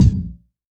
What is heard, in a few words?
Yamaha CS1x Drums